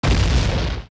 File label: Boulder Fall; zelda; OOT